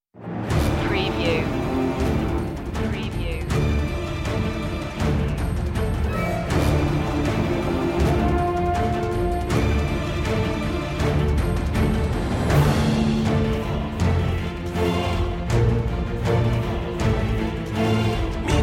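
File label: with choir no solos lower percussion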